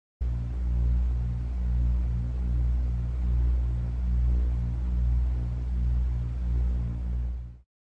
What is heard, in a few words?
New Factory Ambience; Five Nights at Wario's Origins